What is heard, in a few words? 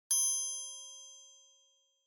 Triangle Single Short